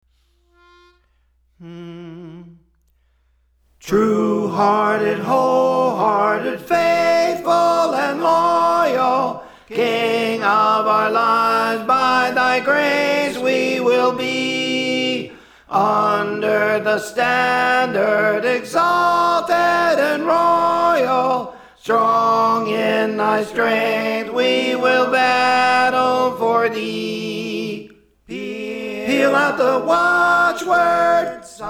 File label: Tenor; True-Hearted Whole-Hearted; Praise For The Lord Training